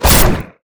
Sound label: boulder crab atk, monster